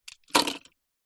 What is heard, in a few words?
leisure toy cars metal set down on table